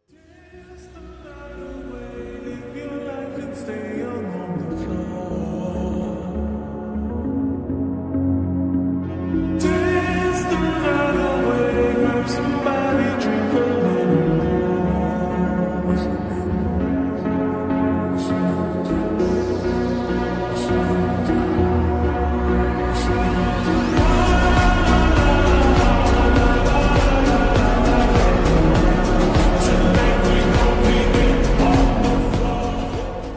slowed and reverb ringtone

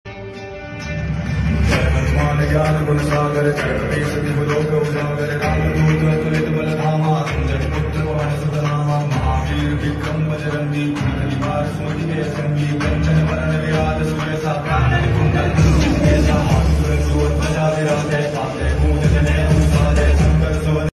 hanuman chalisa ringtone; bajrangbali; hanuman ji

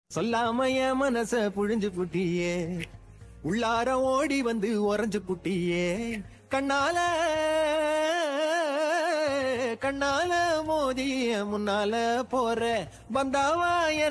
song

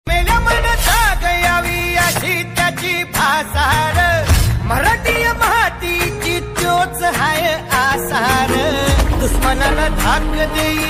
marathi ringtone; shivaji maharaj; sher shivraj

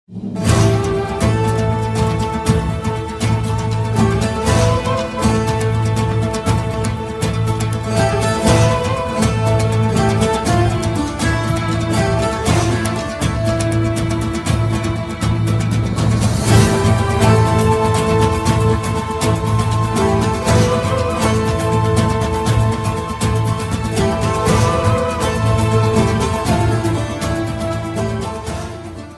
attitude ringtone; kurulus osman

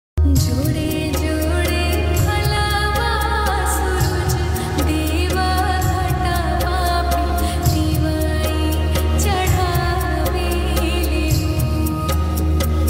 chhath puja song

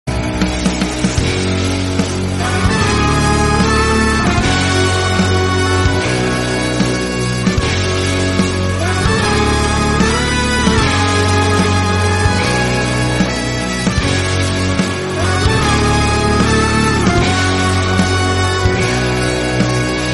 theme bgm ringtone; saindhav; venkatesh daggubati; new upcoming movie

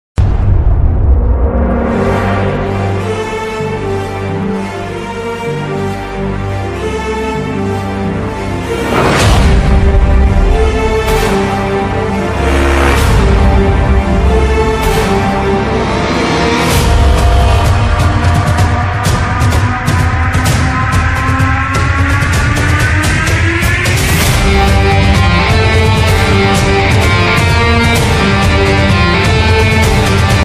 announcement bgm ringtone attitude bgm; salaar; salaar new movie ringtone

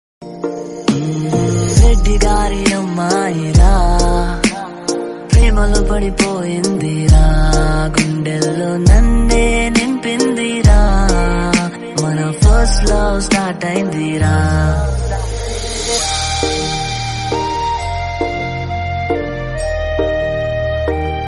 ringtone

